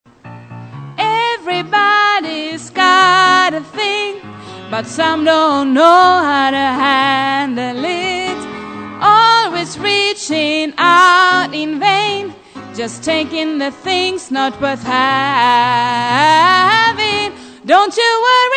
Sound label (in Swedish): sopran, stammor